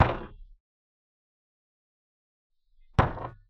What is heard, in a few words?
Hit